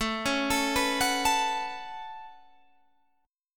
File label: A 6th Add 9th A down Guitar Standard; A6add9 arpeggiated